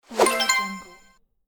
complete whoosh

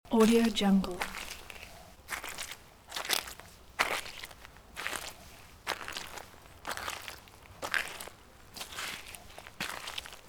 slow footsteps on muddy gravel way loop

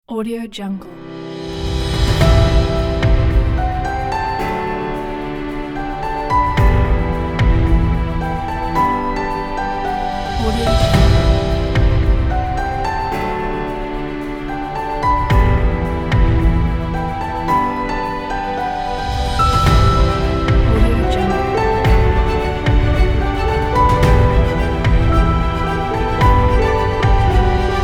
epical

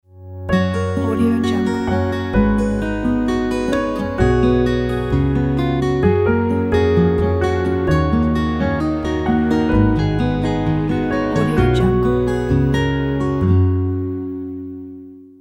good morning ident

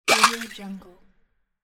water ammo grab; monochromeeffect

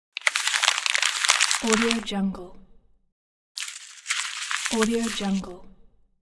creasing rustle paper

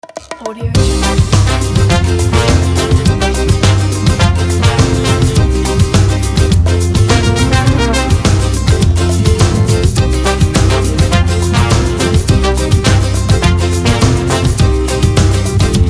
samba ident

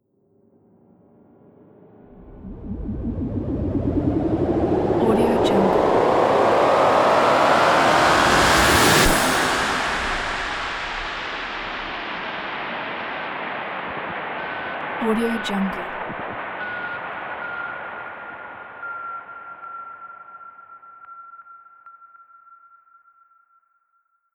long noise uplifter and downlifter

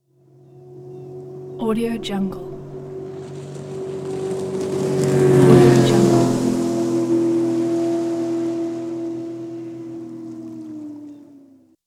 motorboat passing by; wistansound

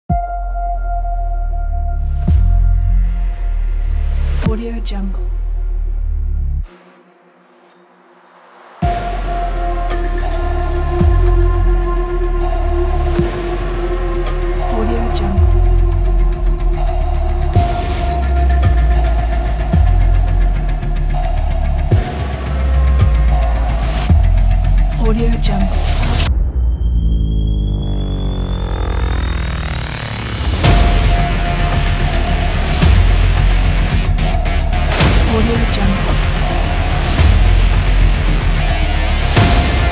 industrial sound design trailer

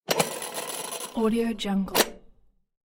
objects container ashtray spin top; blazznet

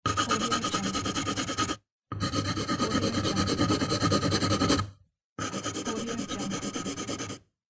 writing on paper sheet with pencil

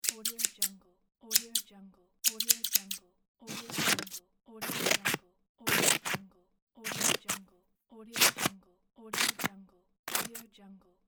scissor cutting

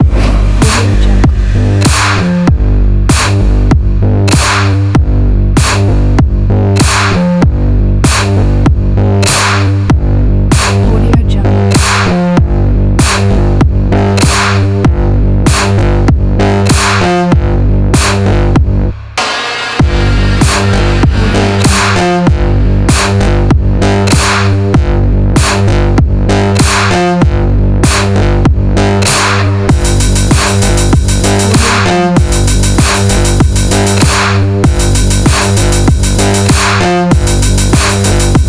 the cyberpunk soldier

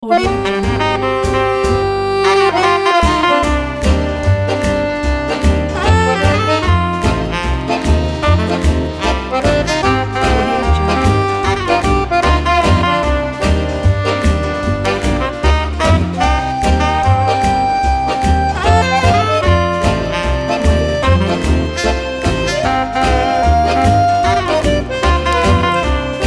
jazz accordion; arrow lab

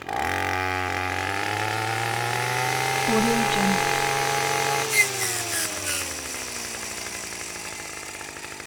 strimmer on axis; soundbits-soundfx

